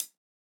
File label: japanese pop hihat